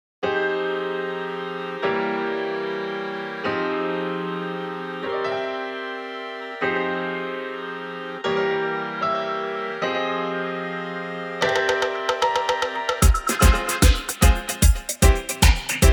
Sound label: vocal dub